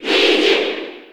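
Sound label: Cheer Japanese; Luigi; SSB4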